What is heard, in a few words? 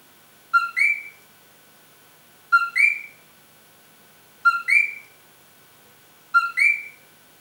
Eleutherodactylus coqui